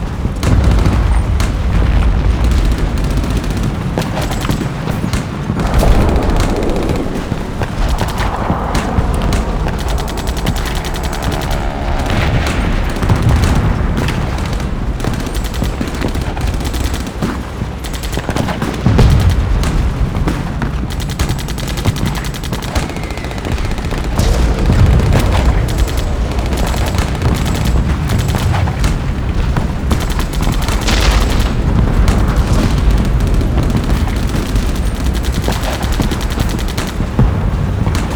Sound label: dday battle ridge; WAR